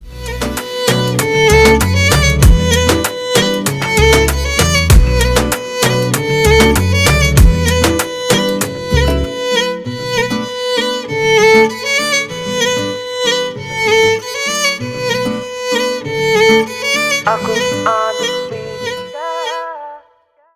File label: Song Instrumental